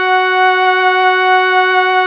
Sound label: PAD ZEN Pad; Best Service Gigapack 1